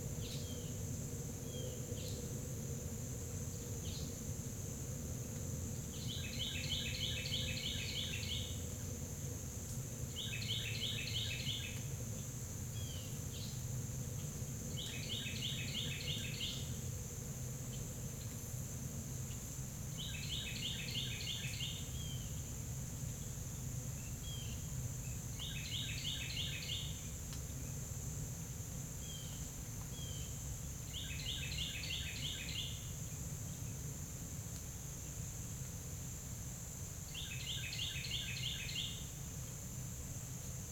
Carolina Wren

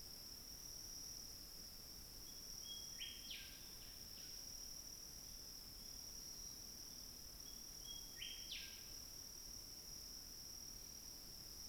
Diurnal forest New Caledonia

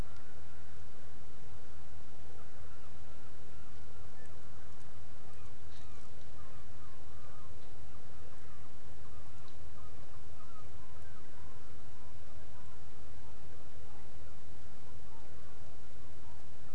Waves on rocks

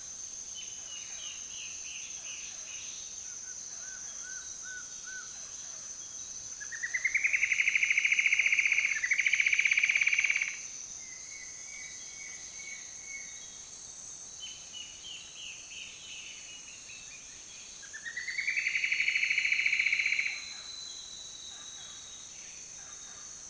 Tropical dawn chorus Peru

